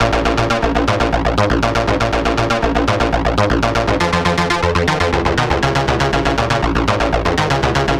Techno Synth

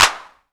PRC 808, Roland

